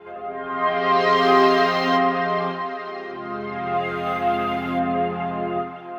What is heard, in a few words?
SYNTH PAD ETHNO 160 C, Sphere